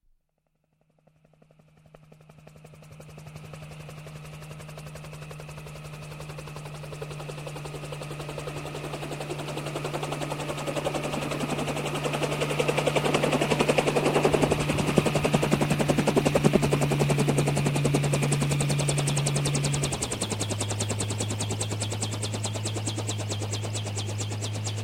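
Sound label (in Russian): вертолёт снижается и садится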